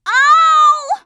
HURT; True Crime Streets Of LA; XBOX

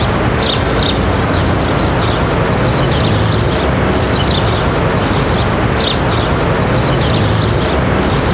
Amb BIRD; Scenery; Decors

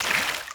puddle

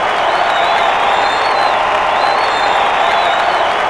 crowd cheer; Totaled; XBOX; crowds

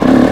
throttle blip low, YAMAHA

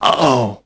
dead, male; voices; Pirates Of The Caribbean

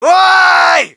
crowd, shout; Mike Tyson Heavyweight Boxing

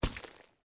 chunk fall, gore; Soldier Of Fortune II Double Helix; XBOX

